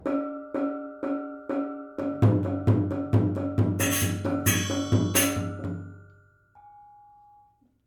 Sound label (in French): Appel Piano Subito, gamelan